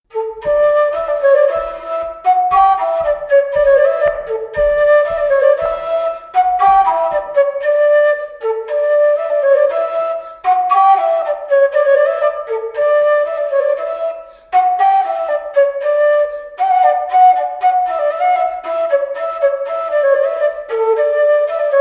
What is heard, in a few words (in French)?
Bourree; Recueil De Raoulx